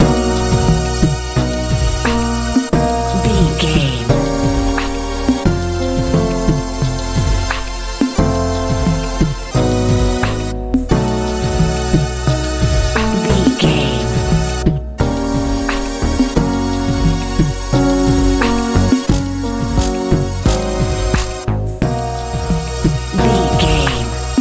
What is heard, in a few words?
hip hop